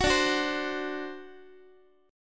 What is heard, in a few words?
D 7th, D, down, Guitar; 4A,4E,5A,5D,5G#,6C#; strummed; JGuitar